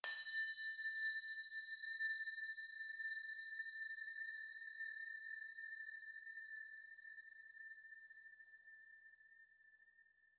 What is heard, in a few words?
bell